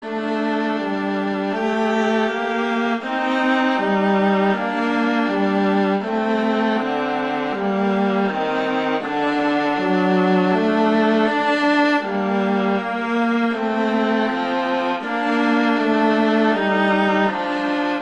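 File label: Romanza, Duets Viola; Pleyel; Duet 4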